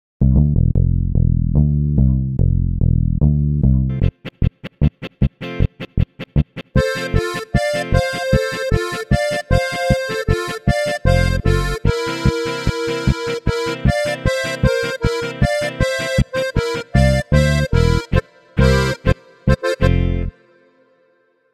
Kontrabass

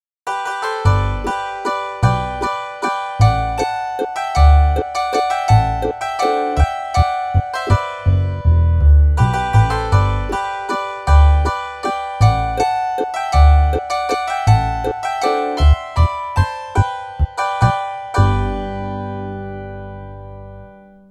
Hackbrett hart ausklingend Kontrabass Harfe Akkorde

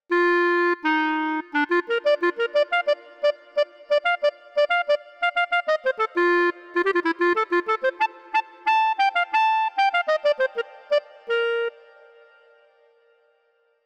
Klarinette SOLO

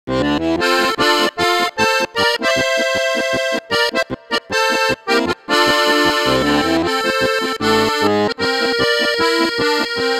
Bass Chords Diskant; Zupan

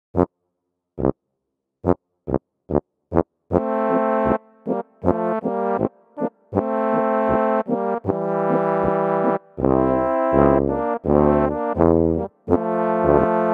F Tuba und Tenorhorn

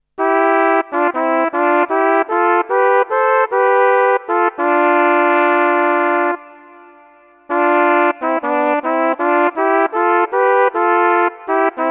Flügelhorn SOLO

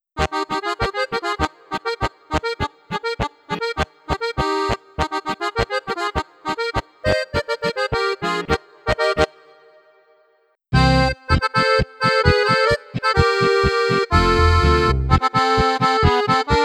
Krainer 3M 2 chörig1 2 chörig2; Alpengold

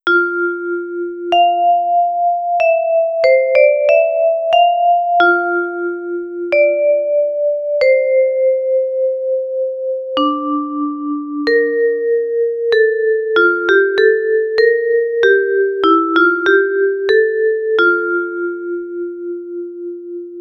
Vibraphon; Turbosounds App

